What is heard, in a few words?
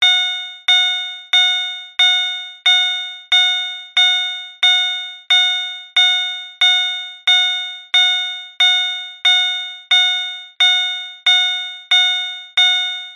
transport car suv holden captiva tone beep keys left in ignition